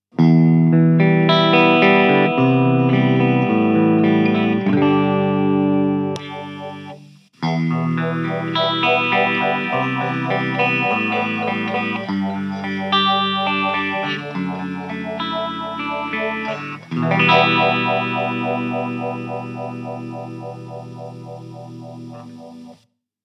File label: Disturbance Filter; Death By Audio